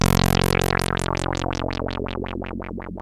2600 F#1, ARP 2600; Hollywood Edge - Giorgio Moroder Rare Synthesizer Collection

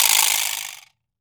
vibra slap; Cuba

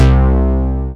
BEEF BASS, OLD SYNTHS; Rubber Chicken Software Sample Bank

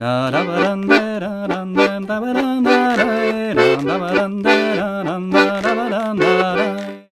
Accordions, BOUREE; USB Soundscan vol.40; AKAI

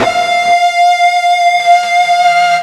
FUZZ F 4, Guitar; East West; Steve Stevens Guitar Samples Collection

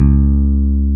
C#3, E-Basses, Roland

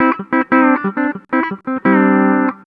COUNTRY

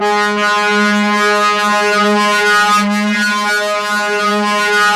FLYER G#2; Music Store; 90's Sample CDs

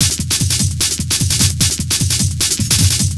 Beat, Industrial

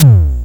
Simmons SD-8

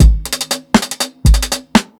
120 TRIPLETS, DRUMS LOOPS; BASEMENT DRUMS VOL 2

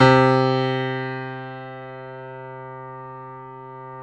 PIA GRDPI, PIA_GRAND, Roland; Sound & Vision; Gigapack I CD 2; PIA Grand 5

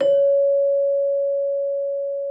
Celesta C#5; Xsample VOL-8

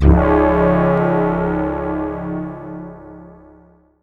C#, BELL GONG, Synclav FM; Big Fish Audio

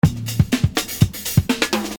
Beat, Hip-Hop Street Beats

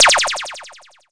dry perc, Quasimidi Rave-o-lution 309

